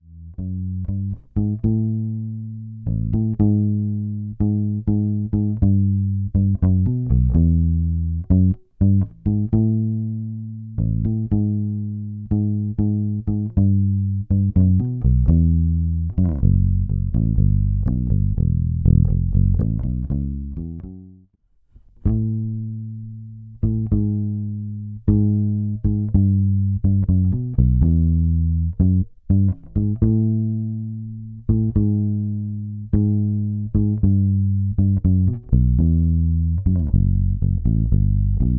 DI cheap quality, Bass running through a cheap vs quality DI; Mixing for God audio examples